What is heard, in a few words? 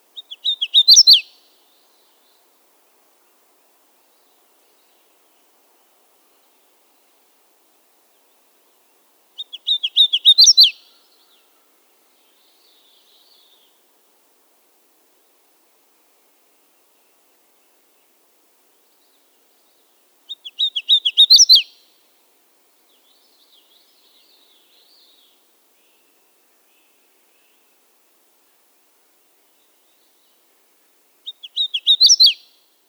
Hooded warbler call